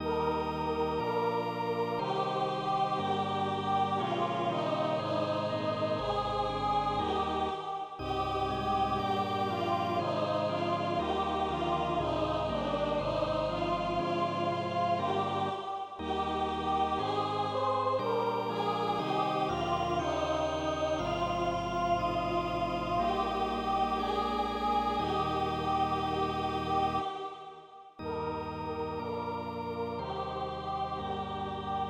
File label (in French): Choral final Passion saint Jean Mib M Allemand Choeur et orgue; Allemand-Francais; Bach